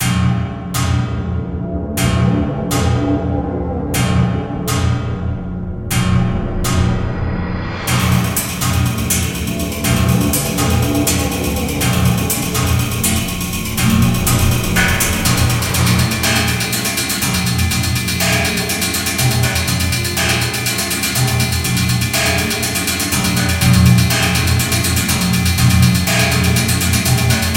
impact steel; impact soundworks; kontakt

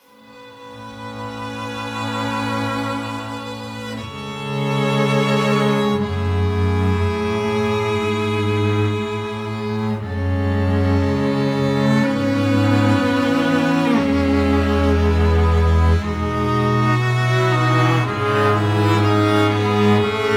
intimate strings; Montage by Splice; Midnight Motifs

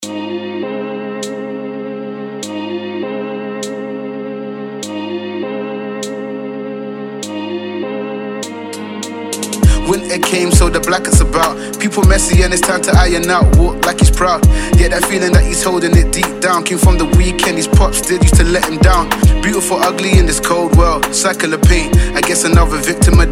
Lo-Fi Hip Hop Vocals And Vinyl Scratch Loops; Komorebi Audio

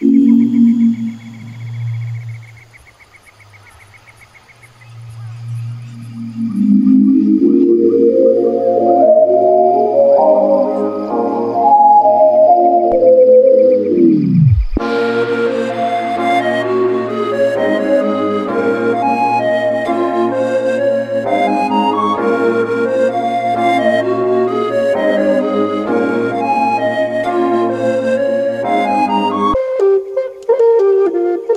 Flutes; Kits Kreme; Flute Essentials

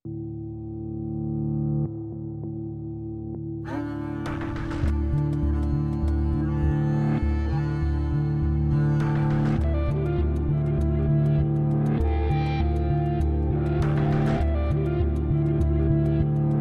Reversed; Have Instruments; Reversed Architecture Upside Down

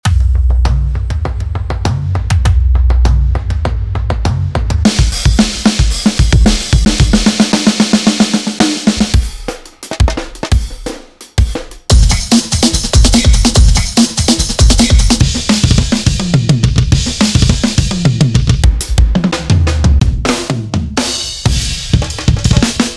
drum pack; Impossible Records; KJ Sawka; 60x60x60

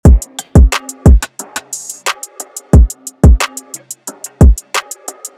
melody loops; Cymatics; Ether

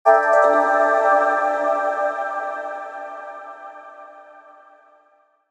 Handpan; Basic Wavez; melodic handpans

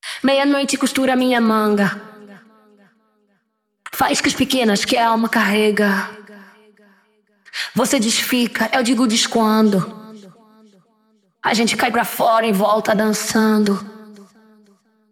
Loopsy, afro house; production suite

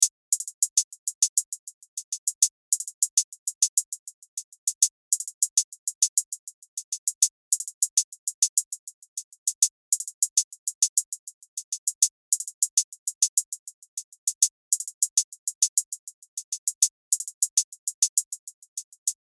Hihat; cymatics; turbo hihat

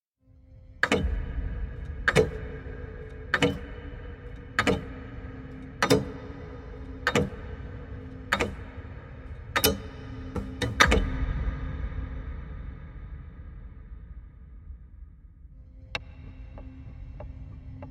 TRAILER PULSE CLOCK; TH Studio Production; kontakt

